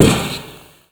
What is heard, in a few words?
foot tin roof, footsteps